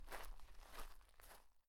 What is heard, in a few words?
walk; Fossil Glyphs